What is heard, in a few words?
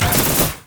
rumble carpet bomb